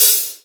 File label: sparkly closed high hat sound d sharp key; hat samples